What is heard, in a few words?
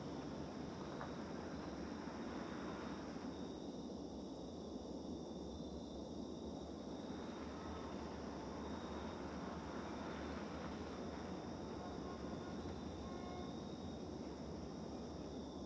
amb